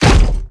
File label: door kick; DeadFright